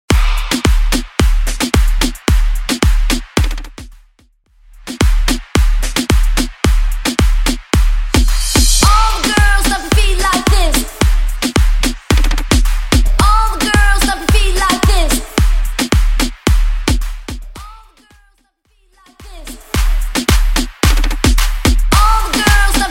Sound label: Dancehall